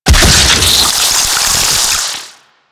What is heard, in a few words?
Fluid Sac Throw Impact; Ambience; Warframe; Infested Eris; Encounters